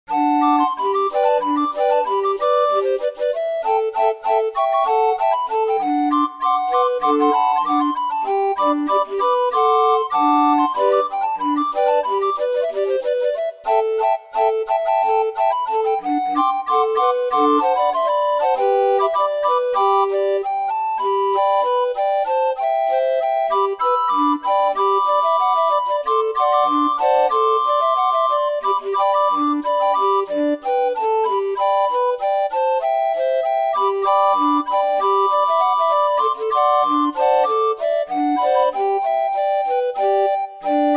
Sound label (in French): Rainbow, orgue barbarie